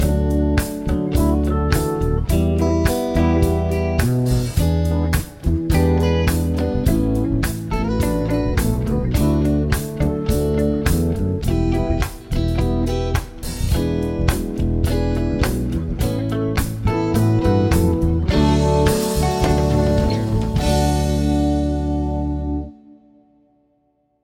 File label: progs with applied chords